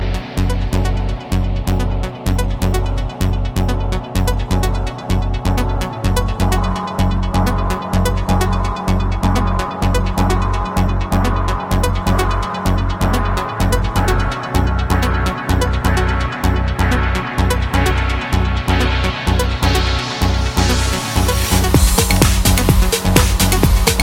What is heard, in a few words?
Breakbeat